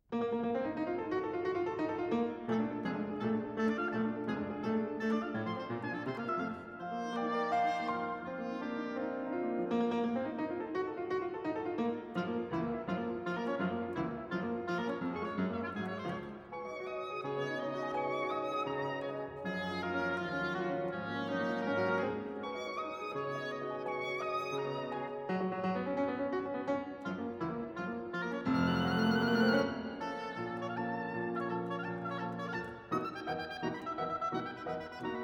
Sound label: piano wind; poulenc